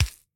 build small; factorio; Factorio 0.14.19

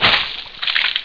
SPLAT

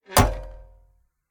metallic chest close; factorio; Factorio 0.14.21

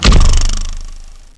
BOUNCE

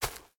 grass, walking; factorio; Factorio 0.14.21

